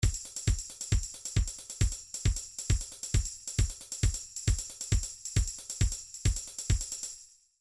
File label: trance midi loops beats rushing; Newtronic Trance Constuction Kit